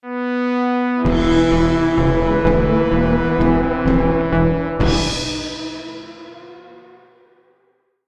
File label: Tune